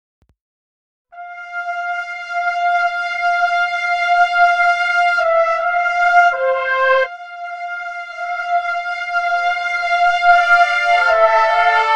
die zarte Fanfare

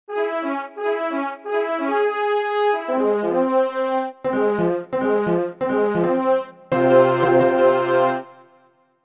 Trompeten Klavier Fanfare

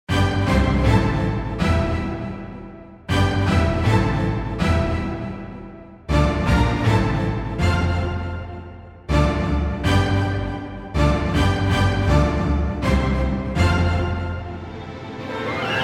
Orchester Finale mit Horn

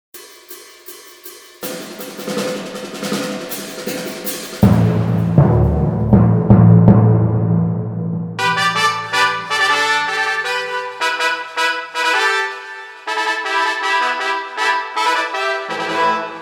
mit Pauken und Trompeten

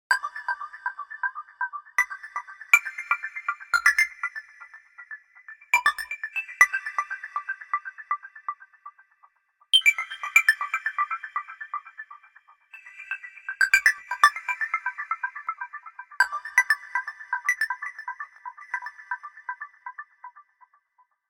Wassertropfen die an mein Fenster klopfen

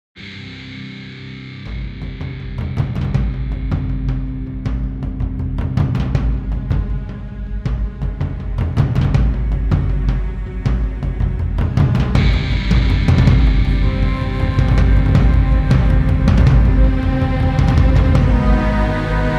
dramatische Orchester Fanfare

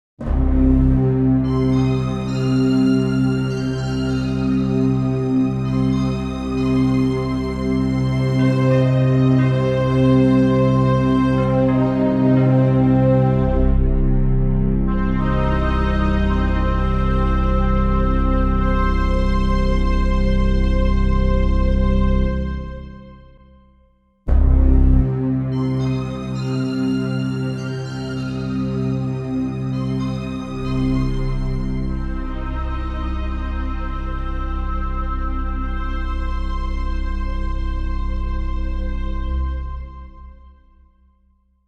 das kleine Orchester Finale

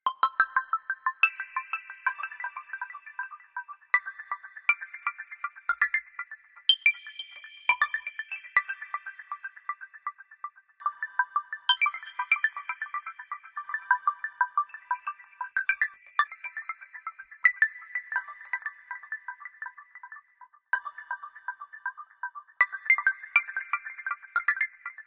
Regentropfen die an mein Fenster klopfen

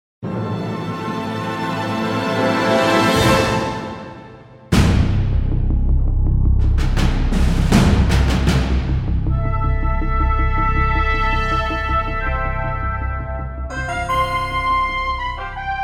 dramatische Fanfare; fanfare dramatique (0:25

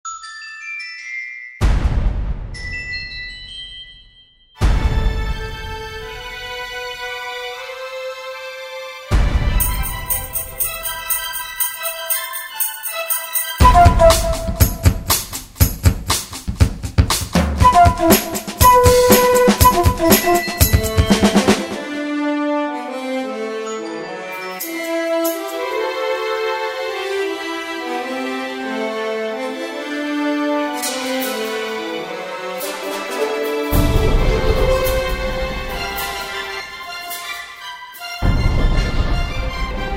Weltuntergangs Fanfare

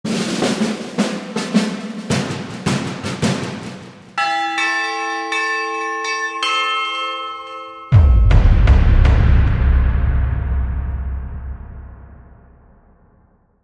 Drums Glocken Boom

